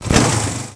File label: Vehicle Motorbike Start; Playable Tutorial Level